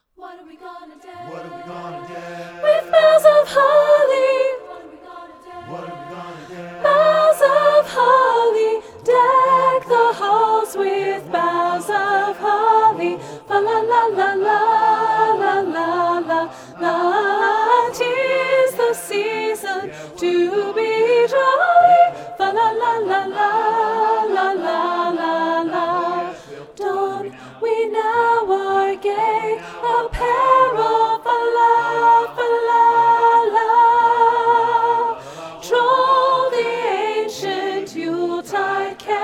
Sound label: SOPRANO, LEARNING TRACKS; PAP DECK THE HALLS